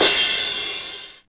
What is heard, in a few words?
909 CRA, TR-909